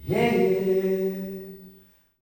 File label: SOFT INTRO, MALE; Spectrasonics; Heart of Africa 2; S.MALEAMB